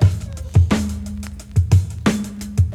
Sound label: STREET LOOP, BREAKBEATS